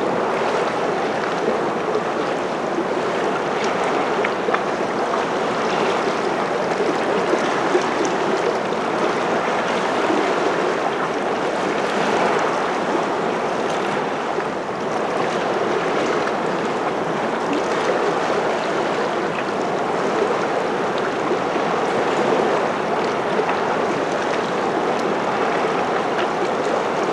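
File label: ambience lagoon water lap shore; Titanfall 2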